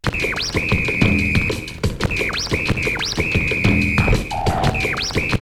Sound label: bird brain 90, drum loops, old school; X-Static Goldmine